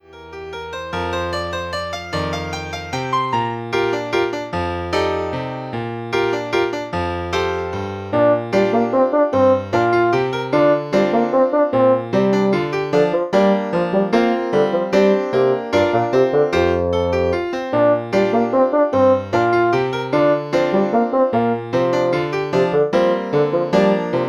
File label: Impressions Bassoon and Piano